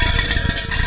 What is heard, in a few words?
push box